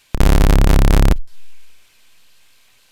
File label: dragon